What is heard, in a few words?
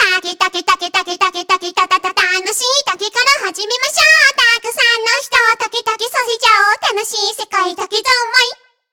Vo Main